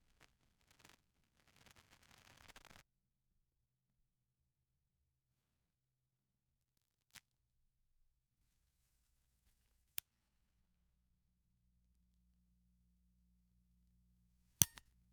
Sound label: the sound of matches bein, fire